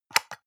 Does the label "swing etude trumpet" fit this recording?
No